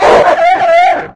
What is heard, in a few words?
hunter defend strider